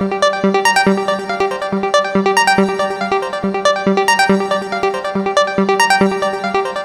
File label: Techno, Melody; Techno Music Maker